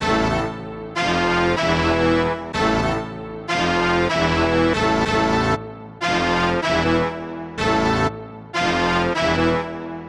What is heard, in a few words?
westside brass mid 95bpm